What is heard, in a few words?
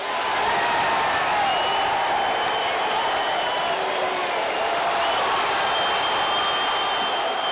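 Boxing Crowd Large Cheer Loop; Crowds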